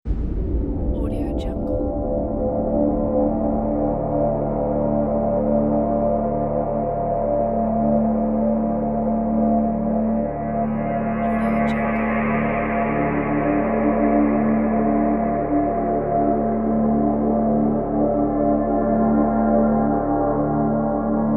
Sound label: murder drone